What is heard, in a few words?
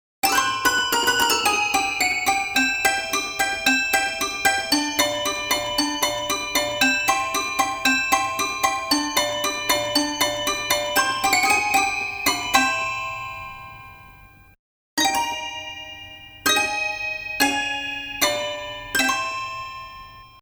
toy piano